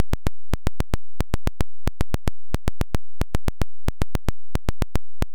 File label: Glitch; Glitchclich; Suonho